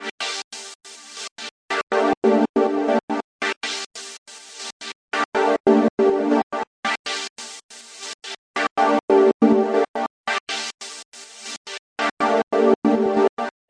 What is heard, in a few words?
stutter phasing pad, 140; SYNTH AND ELECTRONIC LOOPS